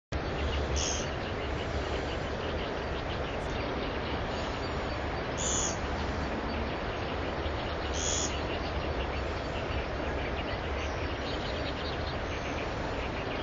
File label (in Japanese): kogera